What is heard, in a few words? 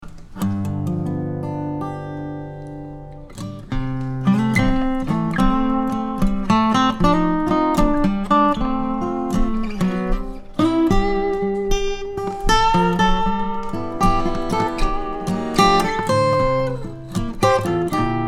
Song Lead; guitar lesson